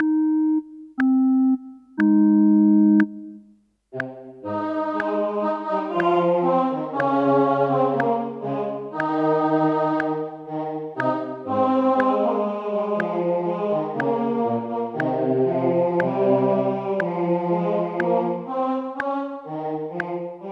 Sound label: O clap your hands; Gibbons